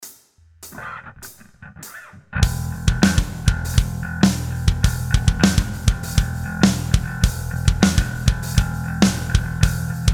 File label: Backing Track; rock guitar mastery